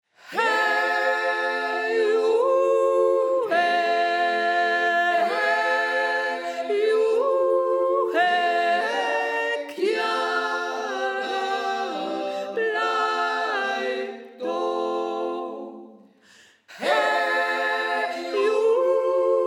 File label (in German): Sopran